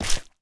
树脂破坏声

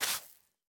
刷子刷沙子声

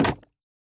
竹木活板门开关声

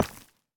深板岩破坏声

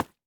蜡烛 破坏声

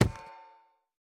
錾制书架插入附魔书声

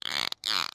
海豚待机声

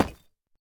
深板岩砖 放置声

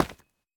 方解石踩踏声

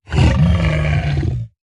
僵尸疣猪兽愤怒声

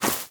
根 踩踏声

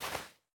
细雪破坏声

